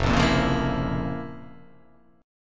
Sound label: F Major 7th Suspended 4th Sharp 5th